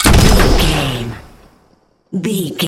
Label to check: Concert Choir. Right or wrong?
Wrong